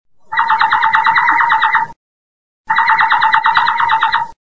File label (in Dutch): tjokken tjoks waterslager malinois